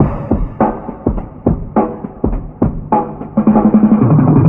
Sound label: filtered as fuck, Drum loops